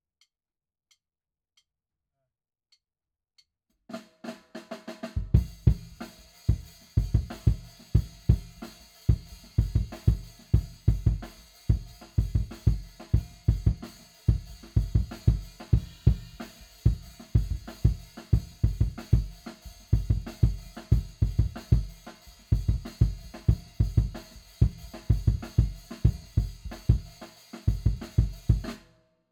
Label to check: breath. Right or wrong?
Wrong